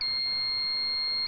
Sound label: keypad deny